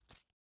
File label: Black Jack Card Exit